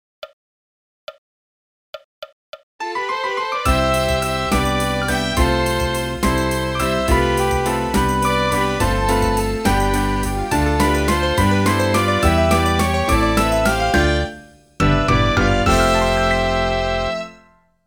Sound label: MIDI; NHK Opening